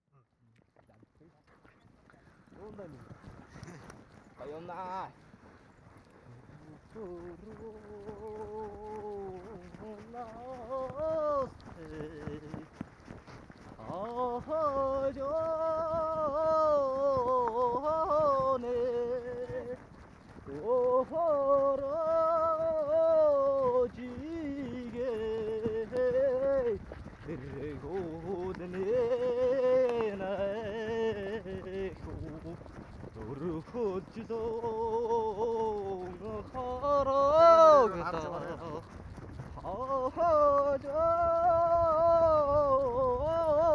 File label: sacred mountain singing, mongolia